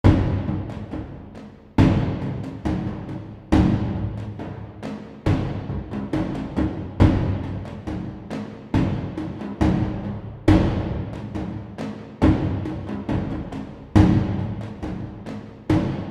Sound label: Ominous Drums